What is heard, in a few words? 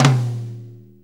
6-8 Tom Flam, Drum Loops; Sonic Foundry (Sony Creative Software); Loops & Samples Drum Tools; Flams